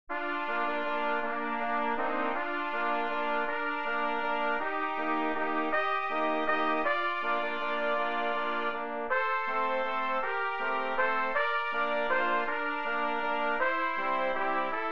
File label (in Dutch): melodie